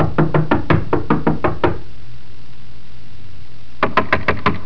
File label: KNOCK; HAUSHALT